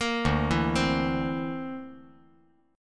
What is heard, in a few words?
D 7th Suspended 2nd Sharp 5th D